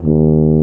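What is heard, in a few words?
brass, C Tuba; Tubas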